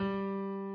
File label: key